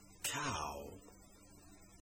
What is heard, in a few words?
cow